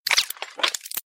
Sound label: printer combo; nyxteam printer